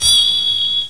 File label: ting